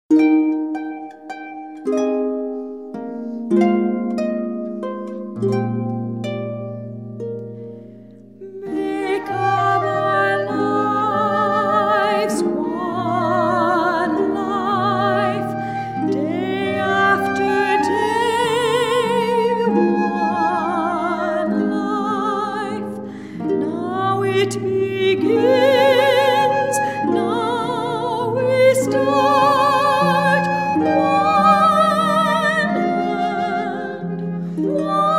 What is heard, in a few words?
harp flute voice cocktail; Wedding